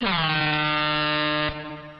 air horn; SoundsCrate

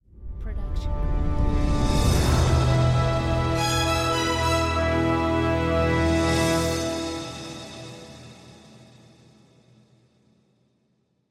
News Ident Network Logo; SoundsCrate

